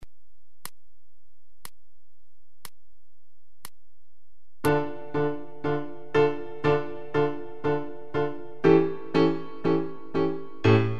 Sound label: Tango Argentino 60; Seiber; Rumba Bis Walzer